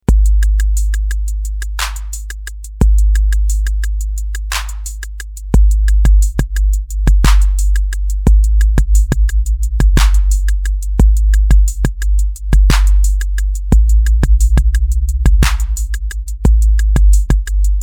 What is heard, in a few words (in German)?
bassdrum interaktion; drumatix